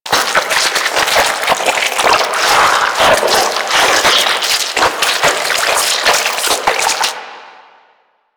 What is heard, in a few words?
Siphon Blood Loop; DE; Warframe; Warframes; Garuda